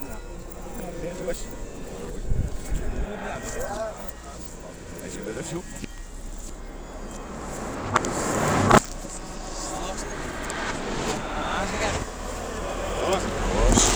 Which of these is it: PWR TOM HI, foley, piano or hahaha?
foley